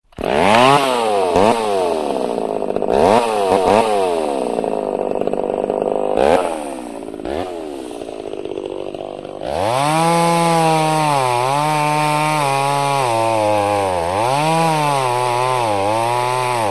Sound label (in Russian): zvuk benzopily, cut down trees sound